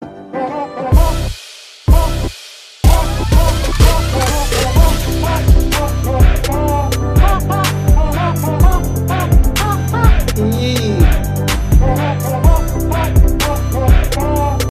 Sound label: YEE TRAP REMIX